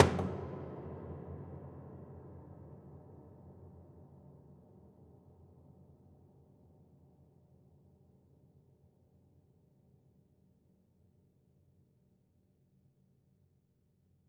Body Thump Ped Sparse, Piano Destruction

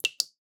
Drips

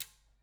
Back Pedal, Bicycle

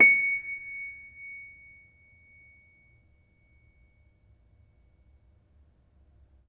String Pluck, Piano; Piano Miscellaneous

